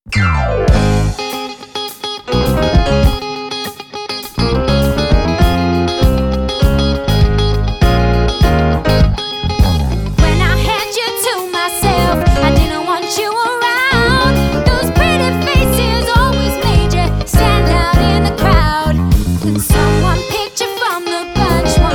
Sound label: Rock Pop Band; Merseyside